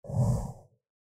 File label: table sliding; poker game